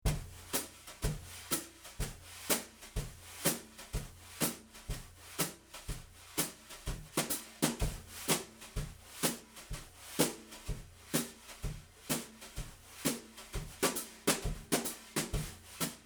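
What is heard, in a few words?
Brushes Overhead only, snare drum; Yamaha EAD10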